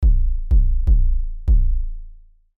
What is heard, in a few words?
kick